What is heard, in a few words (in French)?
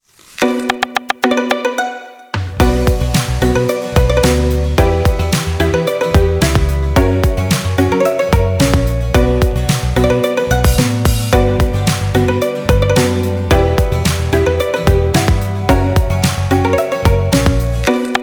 Sonnerie Téléphonique; Enjoy Sushi